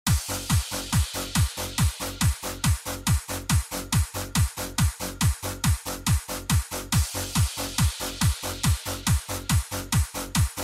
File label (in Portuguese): som automotivo